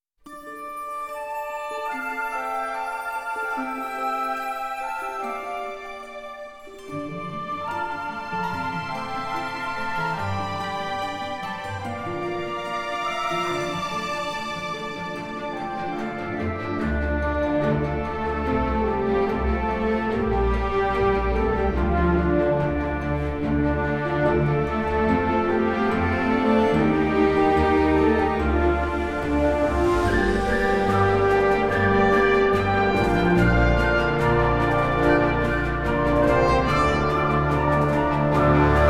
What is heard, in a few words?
no choir